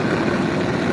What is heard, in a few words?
flame; theGoonies; Retro